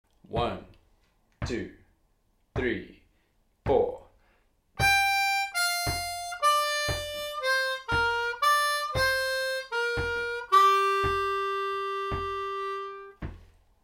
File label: Slow, harmonica